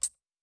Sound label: Foley, Hats; Cymatics; GHOST (Beta Pack)